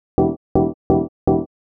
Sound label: house synth 125 A, keys